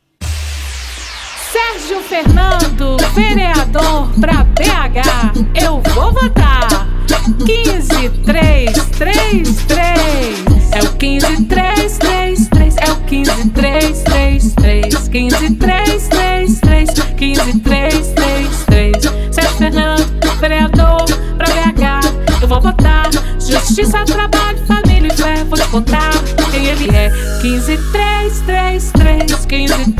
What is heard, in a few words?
jingle funk; material de campanha